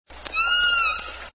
K pod S16 stereo, SRKW calls

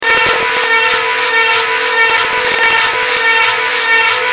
squeaky ship

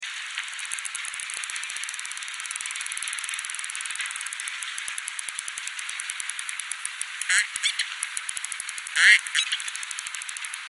click train mono, southern resident killer whales; salish sea data examples; signals

